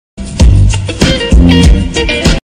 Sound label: quack smoke; cstrike